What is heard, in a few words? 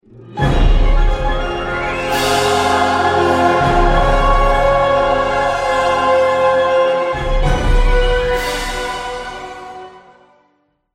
Stab; Black Sun Shield